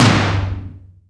TOM, Drums; Best Service; Real Mega Drums VOL-1

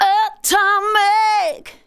vox, Techno Trance; Ueberschall

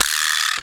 Vibraslap; musicradar; cartoon caper

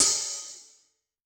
Rock, HiHat; Yamaha DTXpress-II

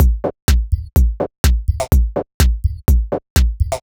C 125bpm, House Drumloops; 90 s Sample CDs; Zero-G House Fabrik Drumloops; ZG Drumloops

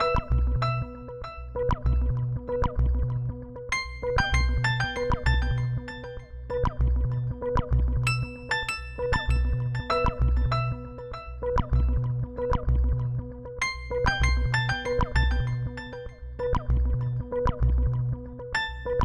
Kept His Melody

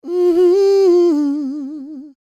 A MMM, Vocal Blues Soul; Spectrasonics; Planet

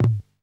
KPR FLAM, KPR-77; Hollywood Edge; The Big Bad Beatmachine

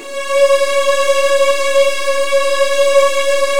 C#2, Virtuoso Strings; ILIO; Kirk Hunter Virtuoso Strings VOL-1

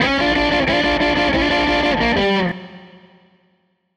Les Guitar Loop

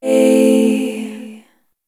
Chord A Minor; Sonic Foundry (Sony Creative Software); Pocket Diva World Class Vocal Samples

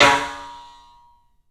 club snare, 3D Drums; 3D Sound

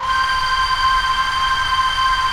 VOCAL WHISP; Pro-Rec - Synthsonic

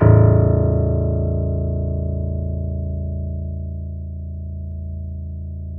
GRAND PNO A0

